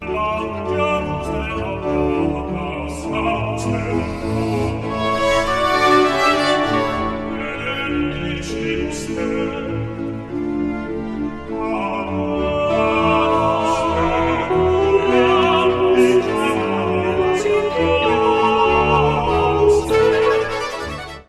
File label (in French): Gloria alto; Stage Zelenka 2023; zelenka zwv21